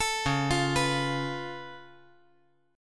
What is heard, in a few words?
C sharp Augmented 7th, C sharp, down; C#+7; arpeggiated; JGuitar